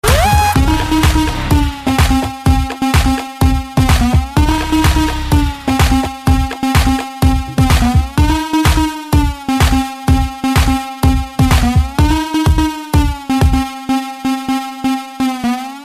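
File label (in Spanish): Pista Instrumental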